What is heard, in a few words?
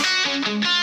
Tone